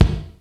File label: DDR30 BD; Crypton - DrumBase5000; RZ1